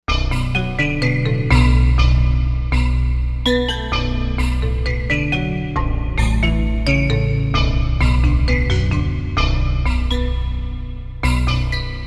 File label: Asian Perc, Korg M1Rex; Factory Patches